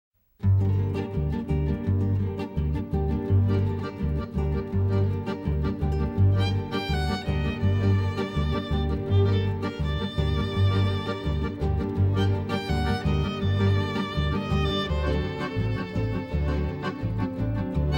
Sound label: Instrumental